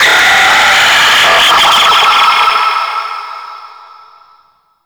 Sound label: Space Drone Attack; Warframe; Grineer